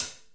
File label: Hat A3 Cl; REAL LIVE KITS; Multisampled Real Kit BJ-HD9